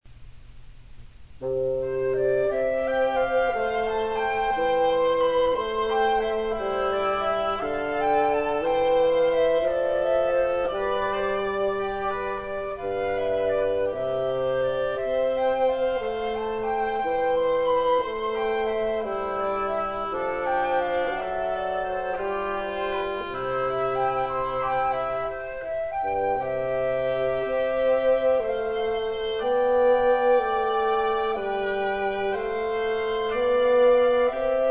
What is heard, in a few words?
Jesu of Man's Desiring, Bassoon Trio